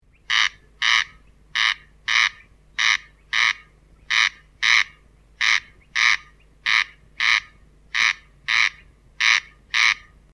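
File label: corncrake, birds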